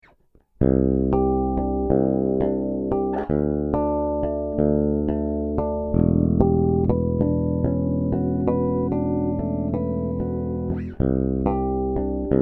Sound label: Cort B4 plus AS Bridge PU active